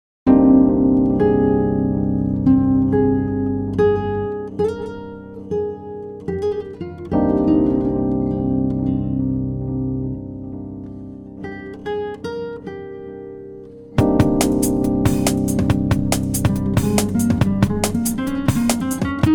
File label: jazz